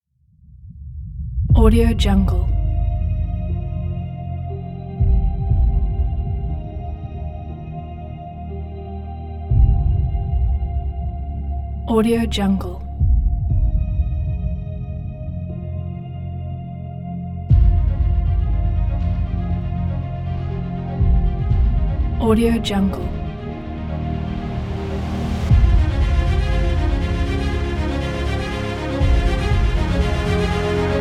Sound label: the cinematic trailer